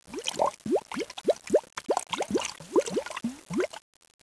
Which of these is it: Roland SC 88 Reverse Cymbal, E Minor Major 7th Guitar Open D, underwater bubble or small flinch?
underwater bubble